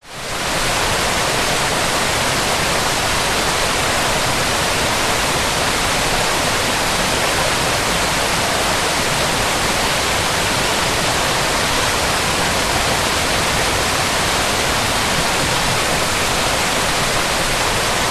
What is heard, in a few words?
nature waterfall med 10 metres away forest; zapsplat